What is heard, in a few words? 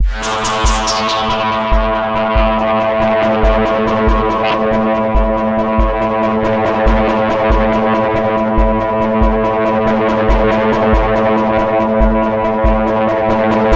SYNTH